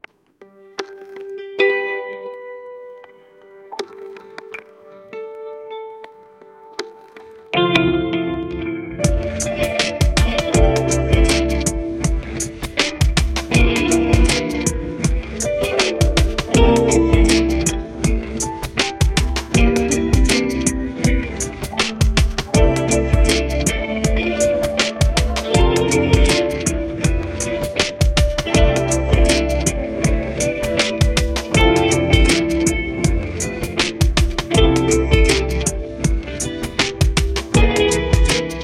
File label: snow guitar, lofi; winter